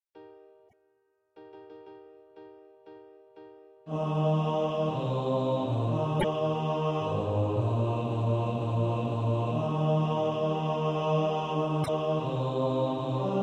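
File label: bass, Practise Music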